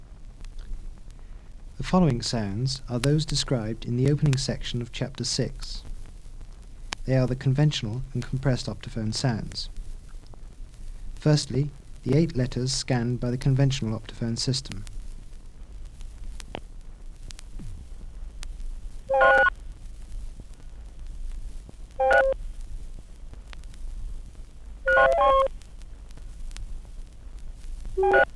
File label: compressed optophone; blindness